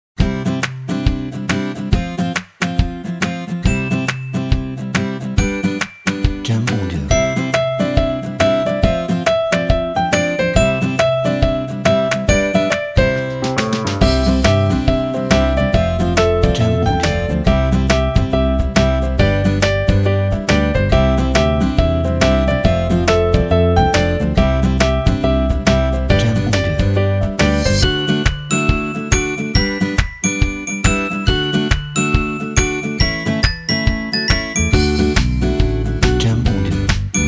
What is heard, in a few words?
Positive Summer Happy